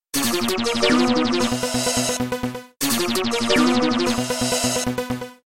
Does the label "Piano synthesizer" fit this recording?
Yes